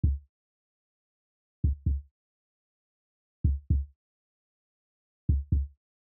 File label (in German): Herztöne